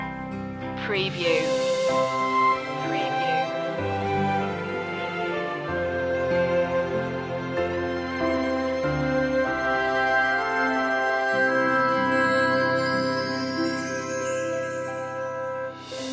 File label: orchestral loop end; silent night; media music now